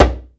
djembe mute, Yamaha Motif ES drum machine; 300 Drum Machines; Yamaha Motif ES-Series

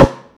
processed snare, Deep House; Zero-G Deep House; single hits

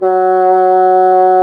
BASSOO, Bassoon; Brass&Woods

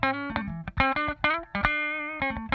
TRICKY, Funk Guitar; 90 s Sample CDs; Zero G

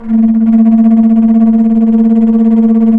FLT, Bass Flute, Bs Flutter

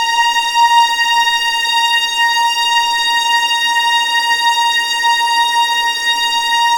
A#5, String Collection; East West; The Ultimate String Collection

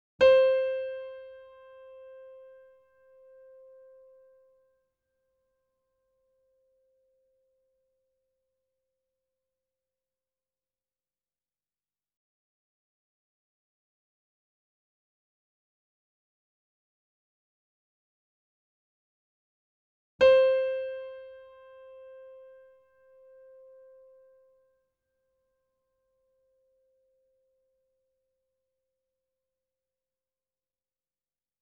C5 E5 A5 Grand Piano & Sample Piano; Sound Sampling Collection VOL-3; Grand Piano 88

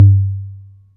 volca beats tom; Korg Volca Beats

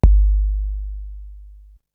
Kick; ZERO G - Datafile DISC 3

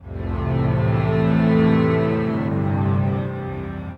Acoustic Drone, Short Loops; Sonic Foundry (Sony Creative Software) - Friendly Fire; DroneLoop

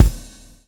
swing

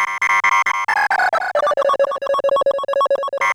Kaossilator gated (130BPM); musicradar

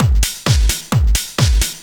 straight, Techno Trance Essentials drum loops

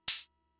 Open Hat, Drum Machines MFB-522; Fricke

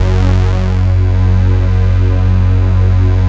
JUNO 106, PADS; Masterbits; Vintage Voltage

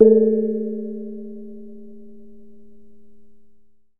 A1 SOFT, Kye Waing

